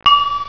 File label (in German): klirr